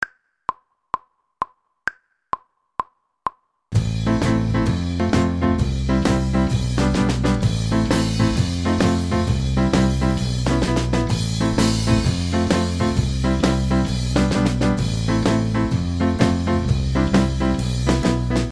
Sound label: keyboard section